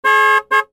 transport car horn beep med long followed by short